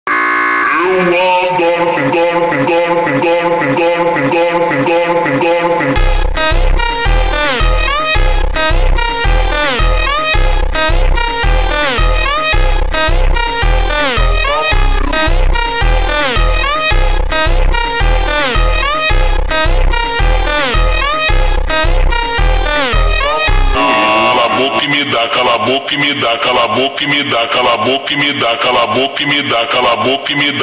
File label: slowed reverb